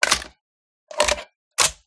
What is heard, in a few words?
flare gun reload